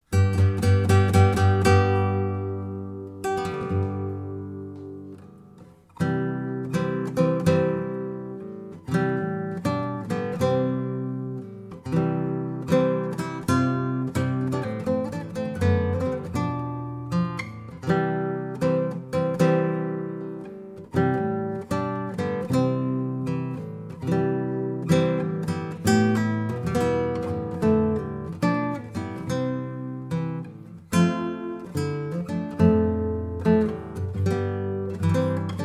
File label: Bridal Chorus